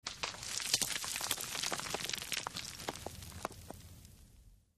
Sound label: Rocks Small Debris, earthquakes